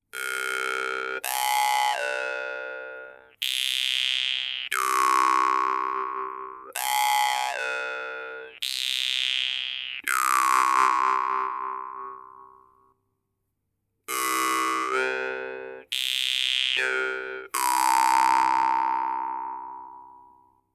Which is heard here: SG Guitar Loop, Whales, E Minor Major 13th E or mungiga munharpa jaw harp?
mungiga munharpa jaw harp